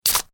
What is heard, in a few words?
beer pulltop